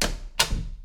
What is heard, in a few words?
door open; Golden Crayon Sounds